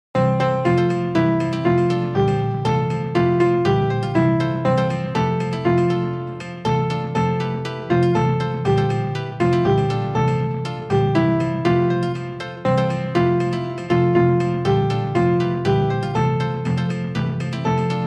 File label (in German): wer den pfennig keyb